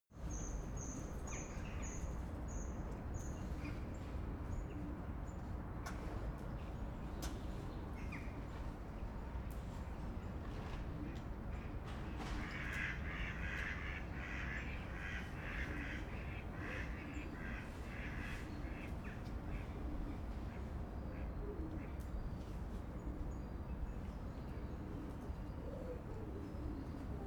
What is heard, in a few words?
canal europe day